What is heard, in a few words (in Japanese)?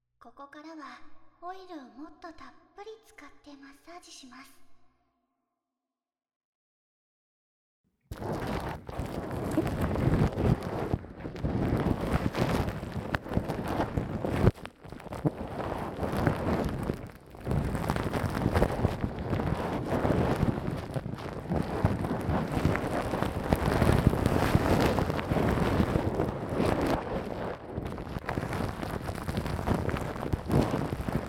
オイル ラッピング, ASMR